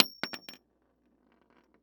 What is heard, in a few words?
Weapon Bullet Shell 44 Shells Fall On Wood Floor; AdobeCC